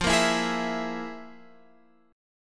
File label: F Minor Major 7th Double Flat 5th, Guitar, Open F; FmM7bb5 strummed; x,12,10,11,11,11